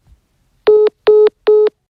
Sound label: hangup call